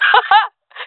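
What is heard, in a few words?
cheer, jungle female; vo; agents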